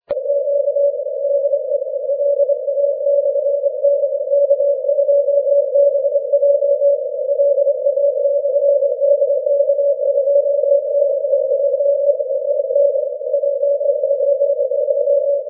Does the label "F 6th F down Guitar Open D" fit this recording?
No